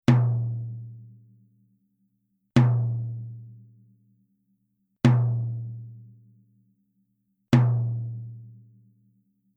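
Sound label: TOM Genera Reso Soft, drum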